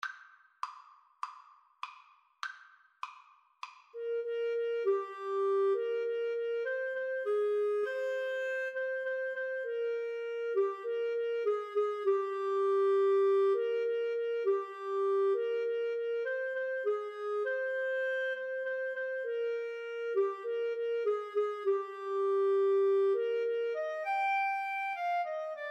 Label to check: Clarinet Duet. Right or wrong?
Right